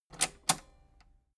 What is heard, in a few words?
metal button robust spring click enable slow